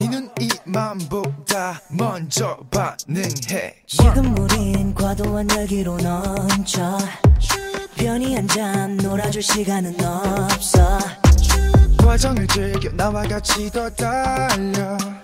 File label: Boom